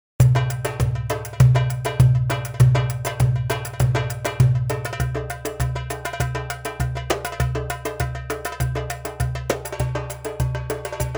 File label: warda s dohlou 100bpm, drumsolo